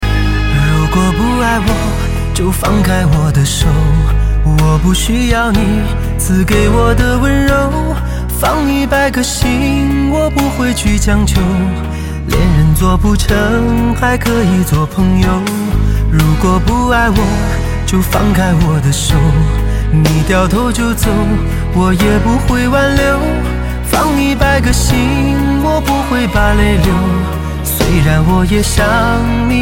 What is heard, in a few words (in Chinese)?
铃声